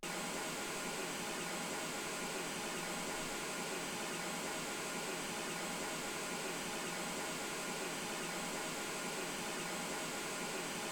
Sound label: mls 48kHz